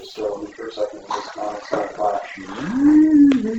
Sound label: Childs voice and coughing; School House in Michigan; Childing Voice and Coughing; Spirit Chasers of the Unknown